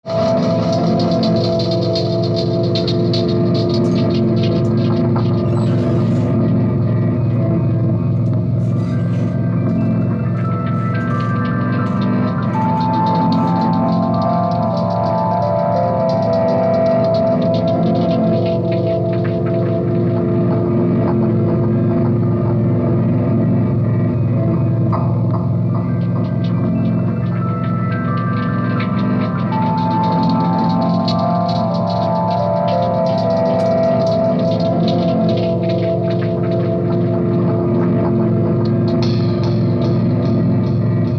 crashing pianos

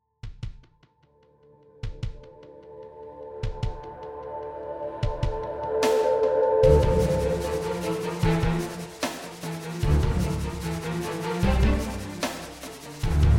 Orchestral Tension

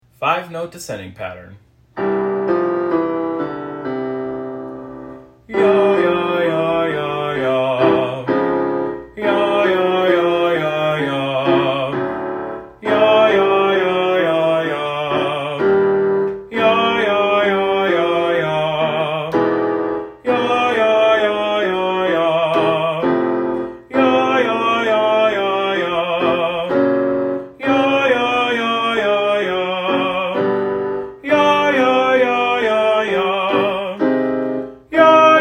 Five Note Descending Pattern; Tenor/Baritone Warm-ups

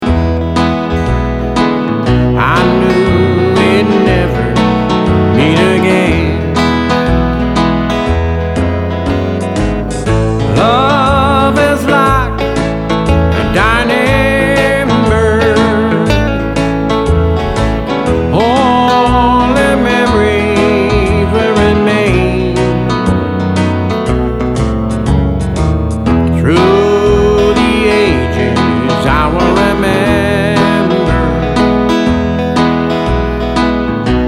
ballad n blues

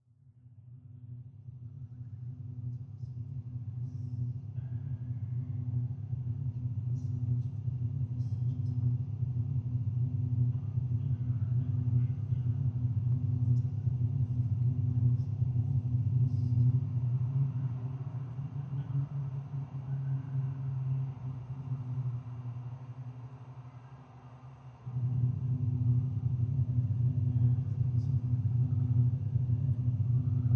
siren

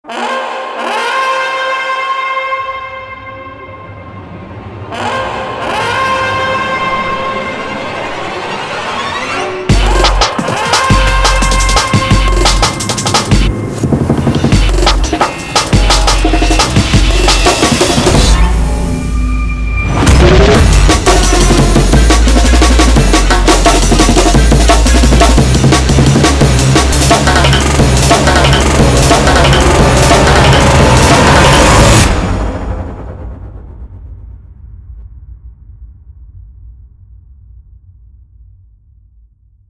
Blockbuster Trailer Music

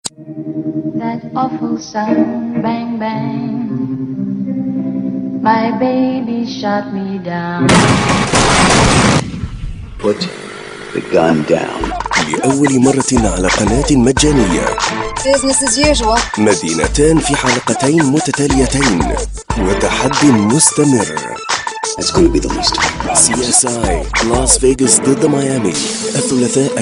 M Classical Arabic

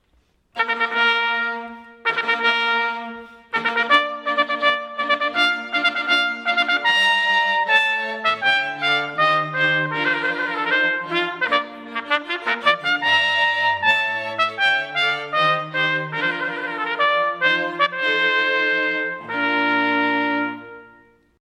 Wedding March

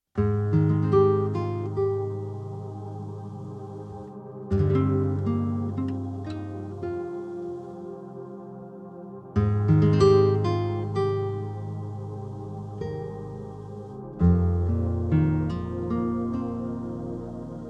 WARM EMOTION GUITAR, Phone Call

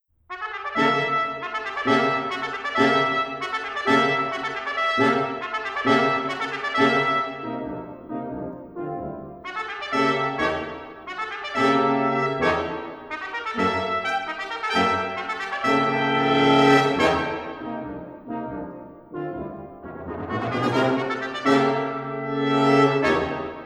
Fanfare

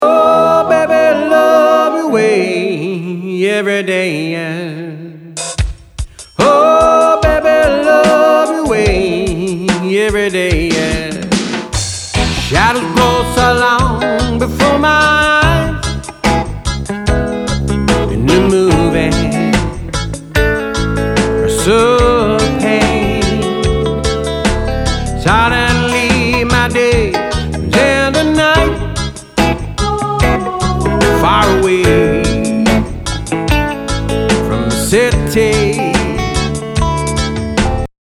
ballad n blues

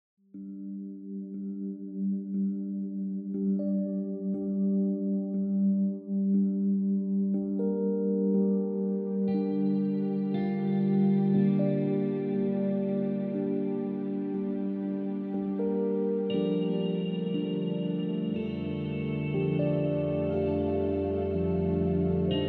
Ambient Hope Electronic; Electronic Reel

